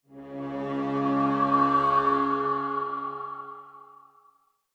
MUSIC HORN RIGHT; adams venture origins; ps4 switch windows xbox one gamerip 2016

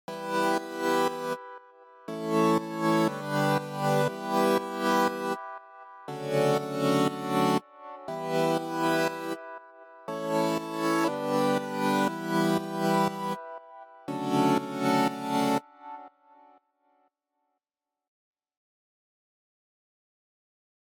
Mellow Mix Stem Loop Layer 2

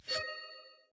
UI Click; gamerip; shuimufeifanjing

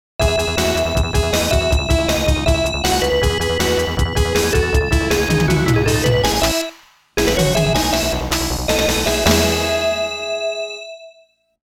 CLEAR (Round Clear); Taito Retro Game Music Collection 4; Riding Hero Cluster

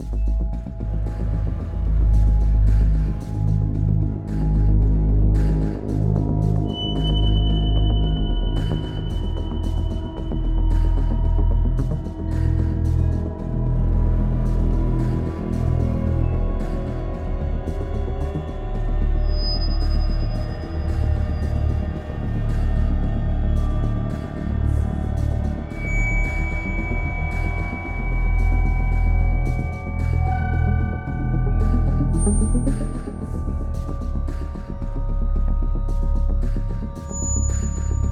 loop Action; the walking dead michonne 3 what we deserve

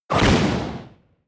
Kick; Mega Kick

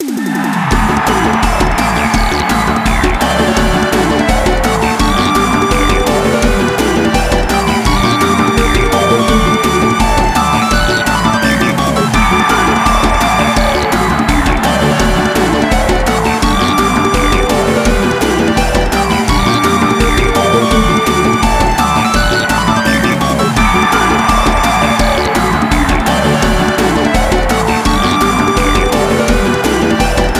Victory Battle Royal; bomberman party edition

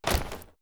Impact Wood Heavy

